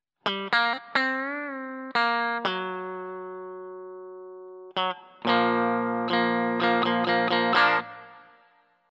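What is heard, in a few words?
bridge neck, guitar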